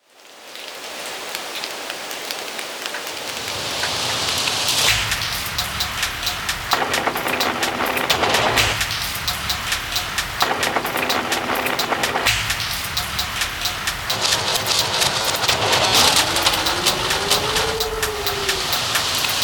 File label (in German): Regen Loop